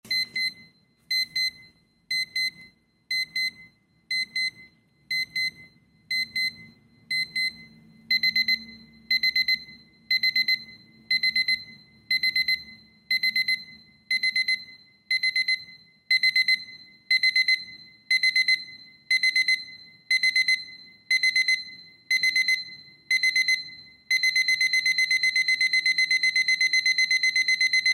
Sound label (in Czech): ukazka zvuku zesilujiciho alarmu